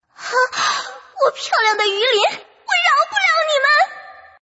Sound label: fish die